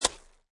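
Impact Metal Debris, Drop on dirt, light thud or smack of small to medium; Impact wood metal cement. Junk crash. Impact of various wood and metal objects falling on ground, cement or floor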